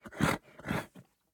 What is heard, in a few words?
horse pair vehicle gearshift; teardown ps5 windows xbox series xs gamerip 2022